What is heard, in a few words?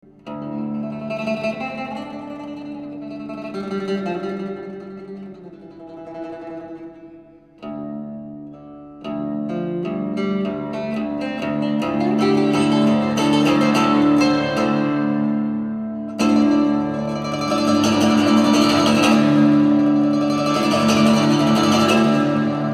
ruan